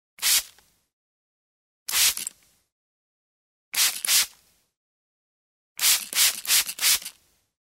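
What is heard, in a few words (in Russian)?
парикмахерская брызгалка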